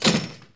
METAL DOOR; shogo; doors

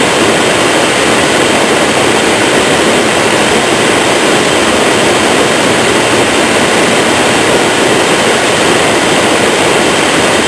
TV Static

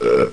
BURP

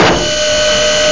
machine

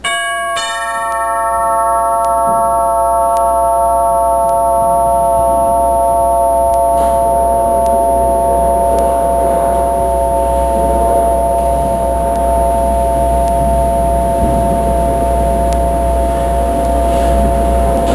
Door Chime; Soundsation

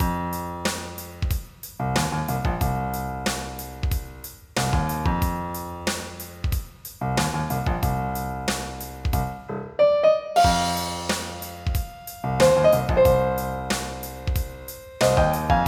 Reggae

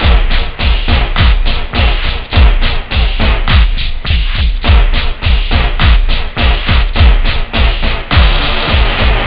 beat, house; OctaMED SoundStudio V1

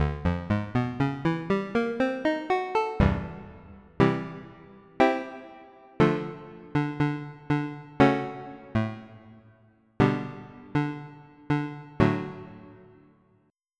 Syn Bass; Onj's Soundfont

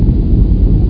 rumble

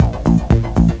bar, Music; 2 bar.a#.02; Swoop 1.0.2

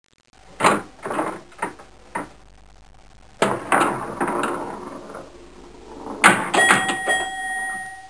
Pinball Machine; Soundsation

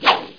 staff swing; Heretic II demo; weapons

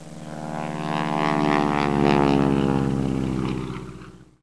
prop

